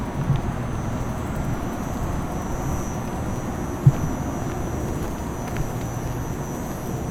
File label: Whine